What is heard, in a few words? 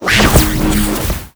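bolt spawn, monster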